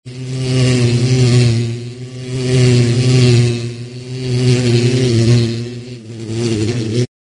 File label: bee buzzy